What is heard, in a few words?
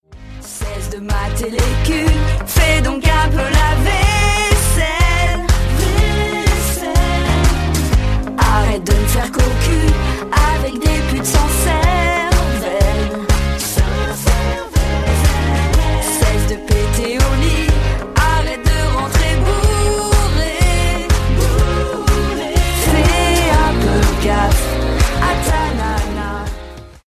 Mozart opera rock; Parodie